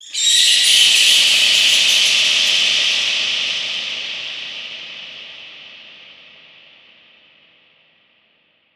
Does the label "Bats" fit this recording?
Yes